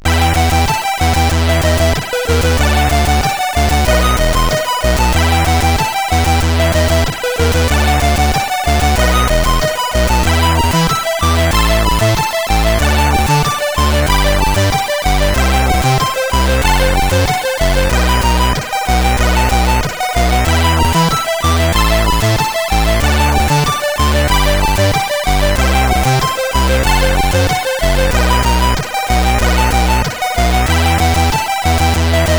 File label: Magic Flute, sid CSG8580R5